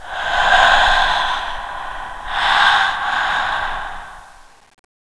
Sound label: zombie ghost idle; ambience; ghostfury